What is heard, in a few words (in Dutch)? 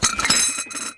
servies, Thuis